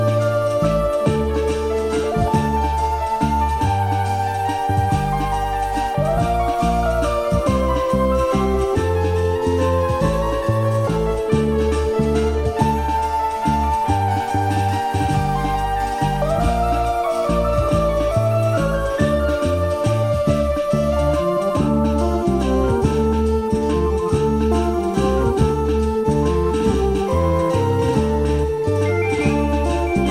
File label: Synthi Waltz